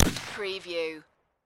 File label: Rifle Winchester 30-30 Single Shot Exterior At 45ft